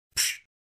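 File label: hitstun small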